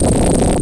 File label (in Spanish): terremoto; sonic 3